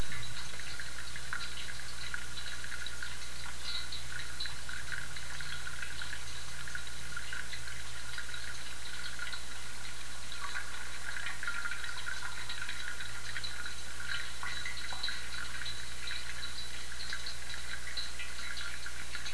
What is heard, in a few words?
fountain